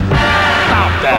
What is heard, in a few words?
kerpow, stabs